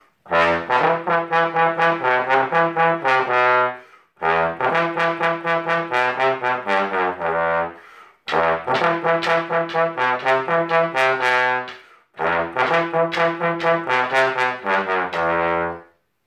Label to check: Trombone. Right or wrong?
Right